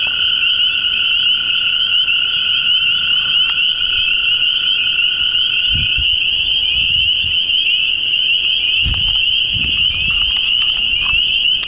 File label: Spring Peepers; Nature